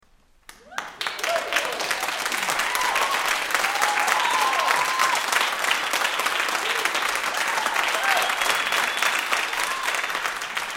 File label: applause